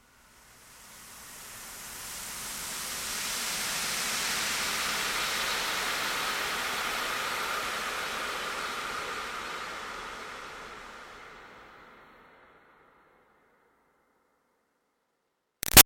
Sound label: Swell Airy Toxin Steam Release Long; Sound Design; Swells